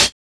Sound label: mellow closed high hat sound g sharp key